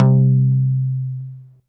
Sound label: TAKK-BASS 2 C2, Yamaha VP-1